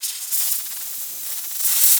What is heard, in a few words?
wurm; wagic; mtg